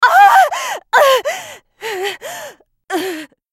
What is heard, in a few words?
dying; Riven